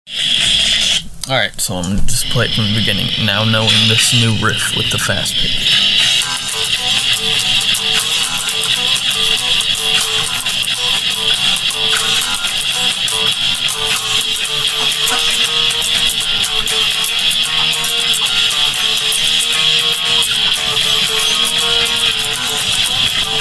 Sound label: Interlude - Learning Music